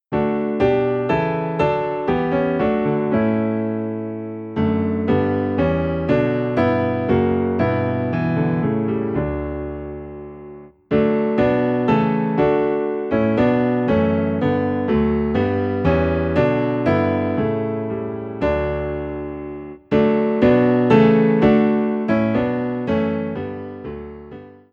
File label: Piano - Low in D; Praise To the Lord The Almighty